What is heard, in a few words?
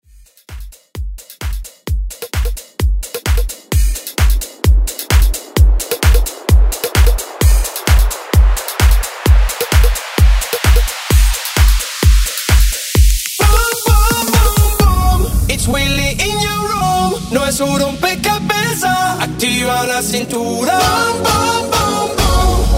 Dance Redrum 130Bpm